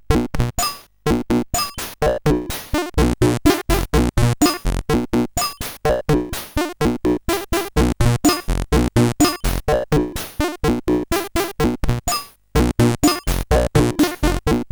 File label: Minimalistic Loop, sid CSG8580R5